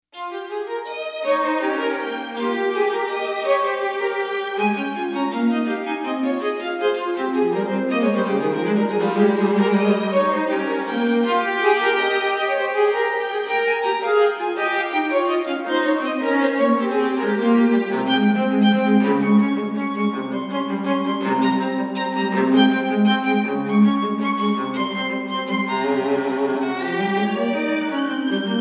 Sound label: String Duo